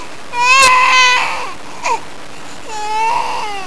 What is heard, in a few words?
scream